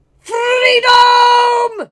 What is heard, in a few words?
Male Death Cry